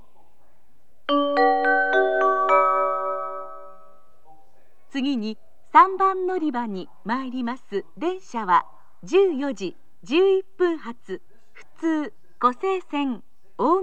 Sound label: local, kyoto, station; omi-imazu; jr-west